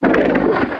stomper idle, enemies; Factorio

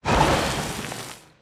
big mining drill start; Factorio

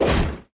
thruster mechanic stop; platform thruster; Factorio

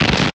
asteroid damage small; Factorio

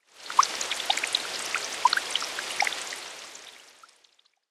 rain on water; factorio

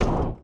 agricultural tower grappler extend stop; Factorio

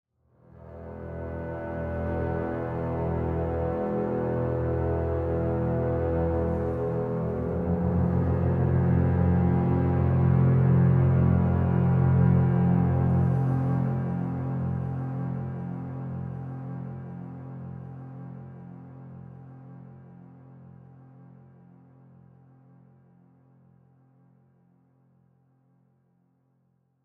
ambient, vulcanus; Factorio - Space Age

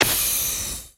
cp smoke mask puff, cryogenic plant; Factorio

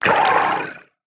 wriggler idle; Factorio

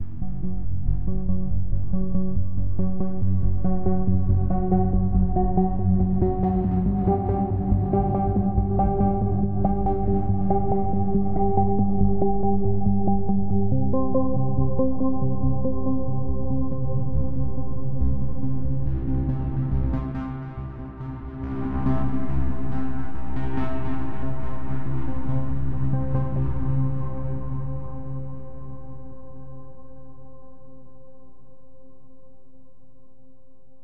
ambient, space, interlude; factorio

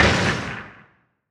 asteroid damage oxide medium; Factorio

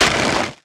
mined lichen tree, mining; ashland; Factorio

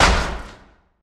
asteroid damage metallic medium; Factorio

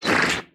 wriggler attack; enemies; Factorio

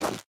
warm stone, walking; Factorio

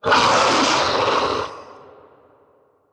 demolisher roar, enemies; Factorio

